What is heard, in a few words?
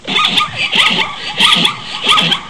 zebra, equines; animals; mammals; stamps